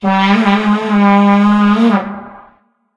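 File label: vuvuzela; video games; Source Engine; tf; football manager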